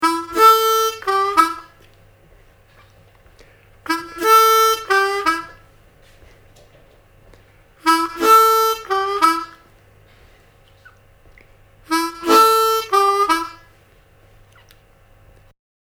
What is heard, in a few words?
Licks Hook, harmonica